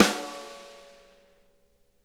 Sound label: RIM, snare; Sonic Reality; Interactive Drum Kits